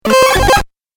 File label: Retro Glitch; Glitch Sound Effects; sound design